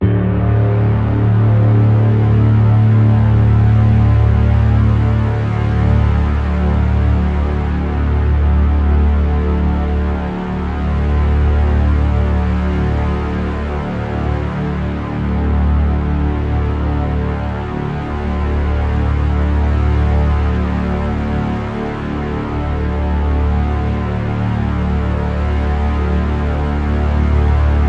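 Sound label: drone nice buzz D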